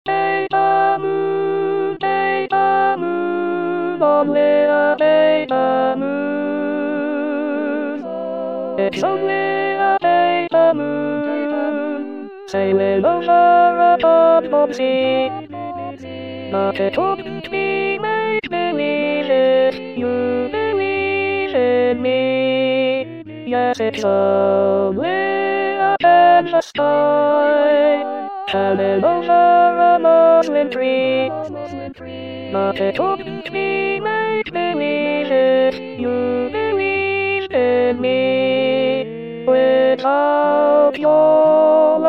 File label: Alto